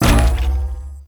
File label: Mushroom Bounce Impact; Warframe; Nokko